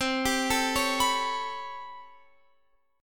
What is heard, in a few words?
A 9th down Guitar Standard, chordshape; A9/C; arpeggiated; JGuitar